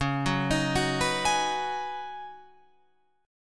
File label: Db 7th Suspended 2nd Suspended 4th Db, down, Guitar Open F; Db7sus2sus4; arpeggiated